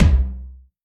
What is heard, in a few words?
Tom